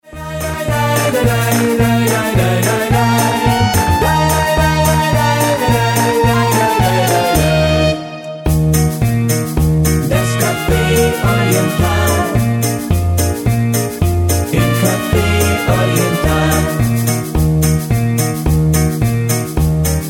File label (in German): Cafe Oriental mit Chor